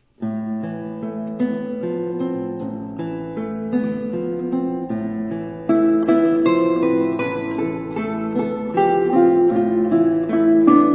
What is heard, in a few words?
8 strings Brazilian Traditional; Acordai Doncela